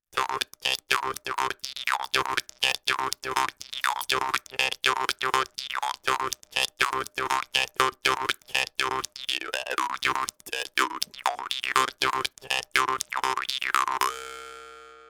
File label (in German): Rhytmus